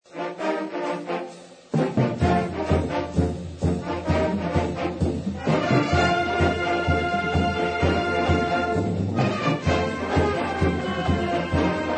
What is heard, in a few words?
marching band medley